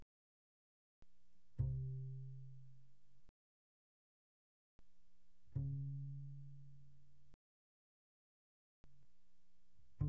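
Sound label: Bass pizzicato pianissimo sul D C3 B3, doublebass; Strings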